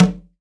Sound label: CONGA, STEREO CONGA